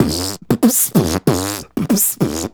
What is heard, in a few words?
Beat Box, Hip-Hop and RnB Vocals